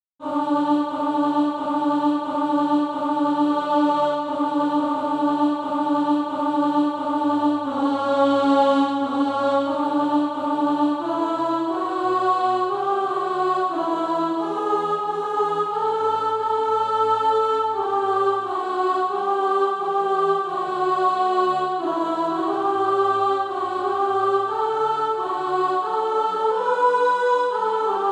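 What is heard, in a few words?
alto; Christ Returneth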